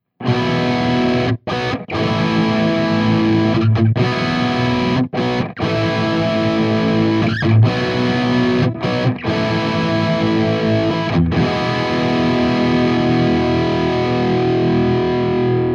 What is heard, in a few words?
bridge gain; ENGL